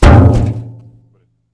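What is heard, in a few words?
grass; Mafie 1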